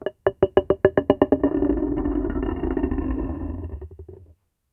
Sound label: a large heavy stone ball; natural